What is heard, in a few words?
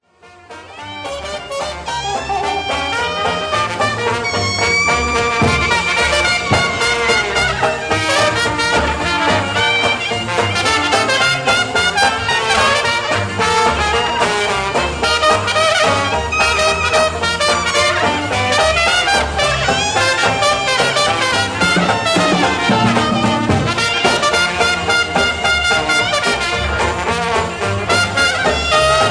Bugle Boy March